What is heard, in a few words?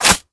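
charging handle release; Valorant; spectre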